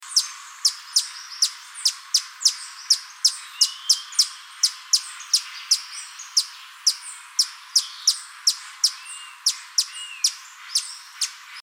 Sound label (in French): ariane a ventre gris, chant